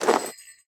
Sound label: armor light; smite; chiron